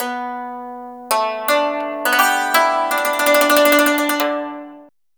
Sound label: YANG CHING, Heart of Asia; Spectrasonics